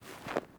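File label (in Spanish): Pasos zapato cesped, shoe footsteps on grass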